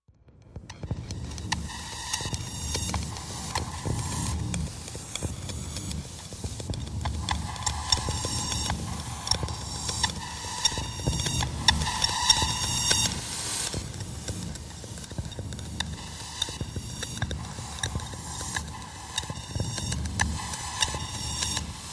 Air Welder Machine Wel

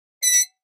Metal Scrape On Metal

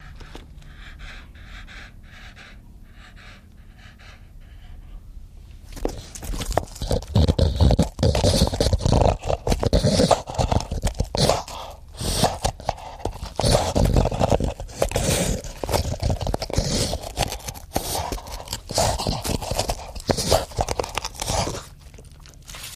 Pit Bull Eating Snort

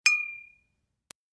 Bottles Clinking; eating & drinking

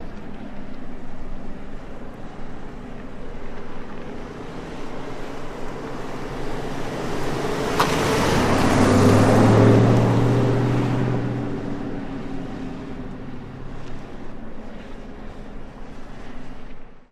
Mustang By Normal

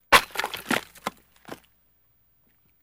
Axe Chop Wood Clean B

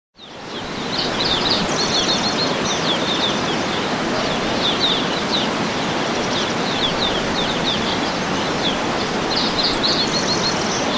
Jungle Ambience Clos; backgrounds

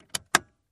Dodge Van Switch Push; switches

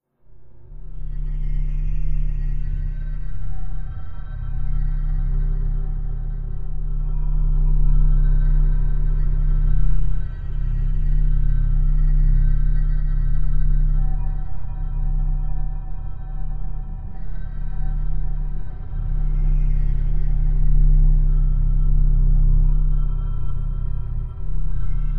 Space Amb W Low Sub, deep

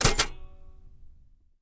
Typewriter Shift Ke

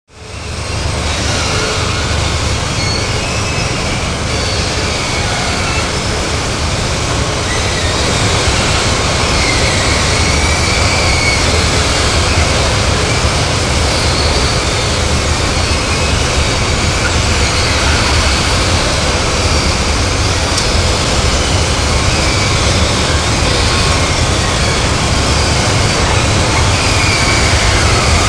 Hydra Pulpier Convey, conveyor belt

